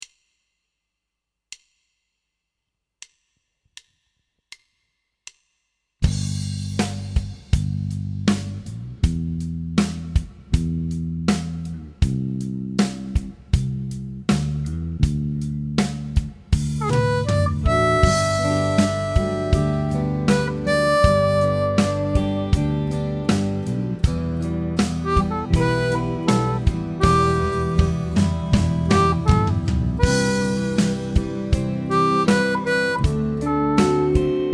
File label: G 80 harp